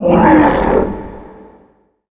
DIE; OUGER

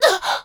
Hit Voice

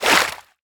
Idle squish

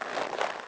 Idle leather mid

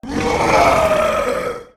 Death

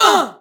Hit

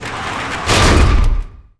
Door Close Metal

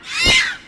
hit harpy

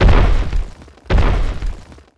Walk

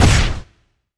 hit

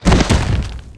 DROP

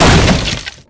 Door Hit Wood

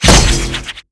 Weapons Swing

